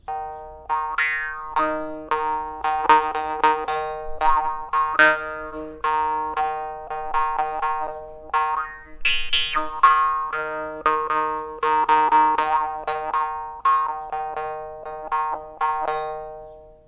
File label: mor4ong lizard